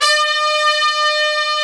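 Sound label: trumpets swell; Roland LCDP06 Brass Sections; Tpts Sec.1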